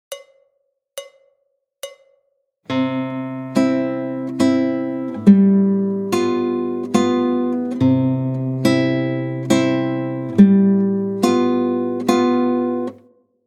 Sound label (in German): Walzertakt mit Griffwechsel C G7, gitarre